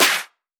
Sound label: snare